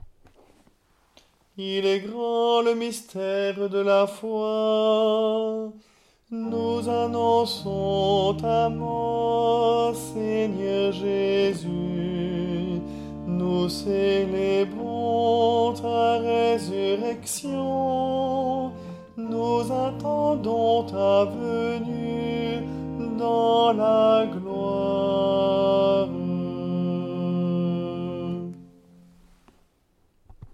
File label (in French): Anamnèse, liturgie catholique